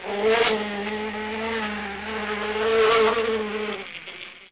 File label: fly; Pirates Of The Caribbean